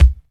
dry bass drum sound c key, kick drum single hit tuned to the C note